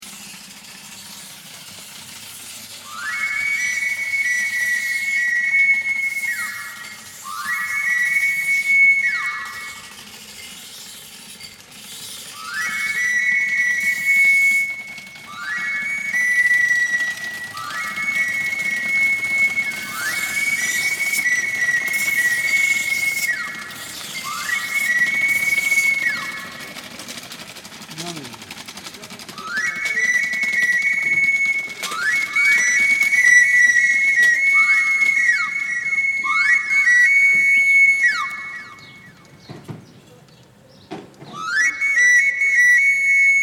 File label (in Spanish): el afilador; paisajes sonoros